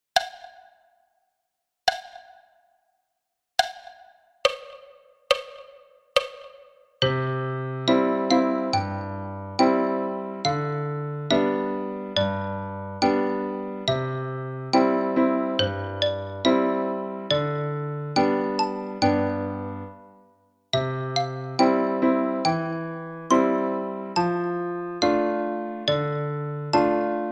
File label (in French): tutti a 70 bpm